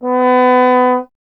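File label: bass trombone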